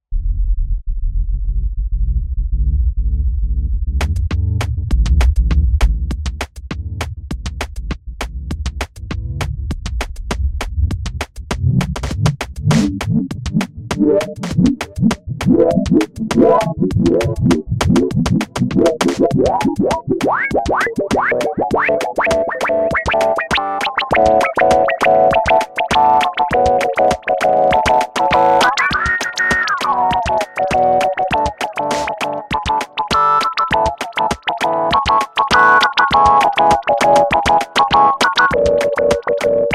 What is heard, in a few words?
Sawer Resodence